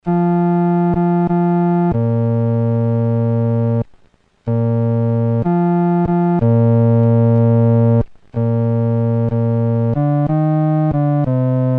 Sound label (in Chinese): 独奏 第四声